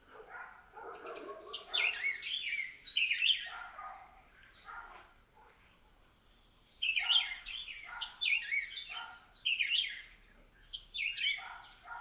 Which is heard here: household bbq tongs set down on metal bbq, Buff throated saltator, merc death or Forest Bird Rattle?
Buff throated saltator